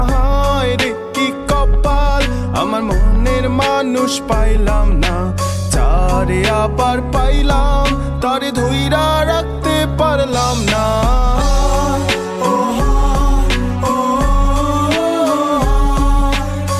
Bangla Song

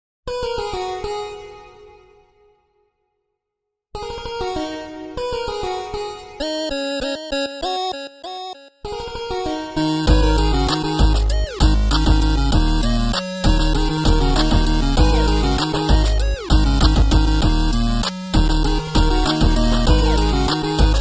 8 bit

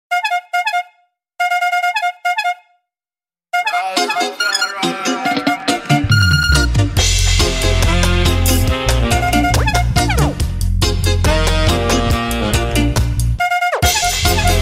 Reggae Mashup

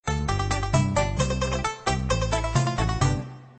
Comedy Tune

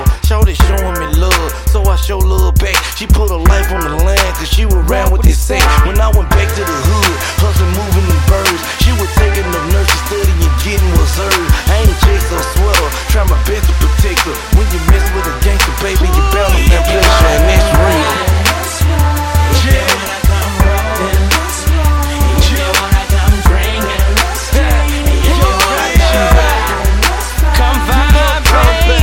vibe remix

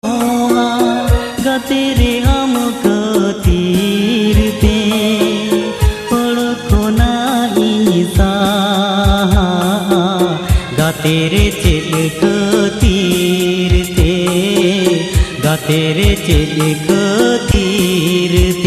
Santhali Ringtone

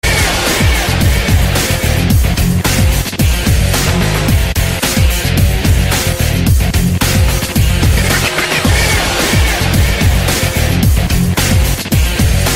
Gaming Music

